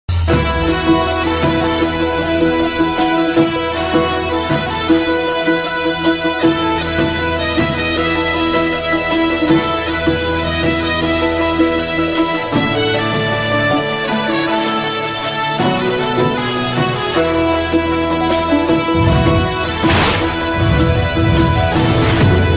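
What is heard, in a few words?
background music fast version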